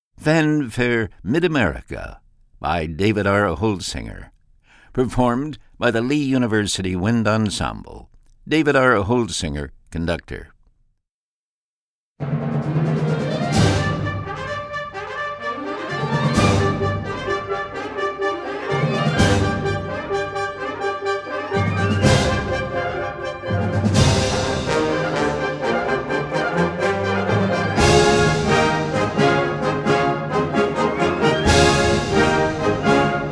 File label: fanfare; mid-america